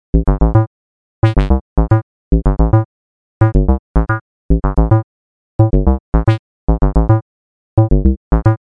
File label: bass